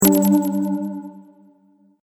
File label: Sci fi Hologram Interface Sound